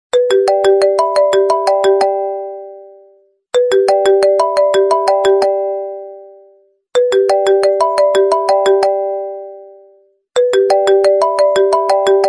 classic iphone

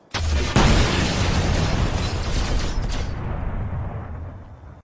gjallarhorn

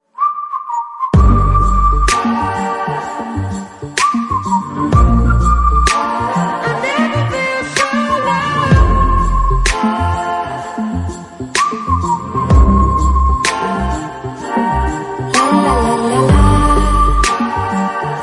trampoline

